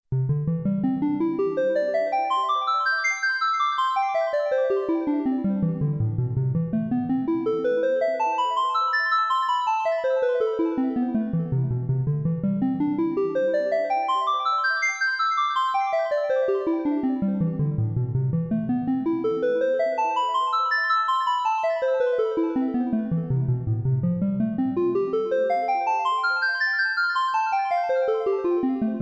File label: Arpeggio